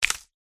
crats attack contact up

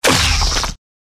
Scolopendra WOUND

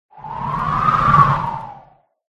dragon blue

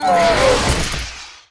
Legend Cerber Die

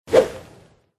tiger dodge

